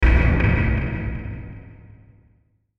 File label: Hit Drum Low; Hit Drum Hollow Delay; Blastwave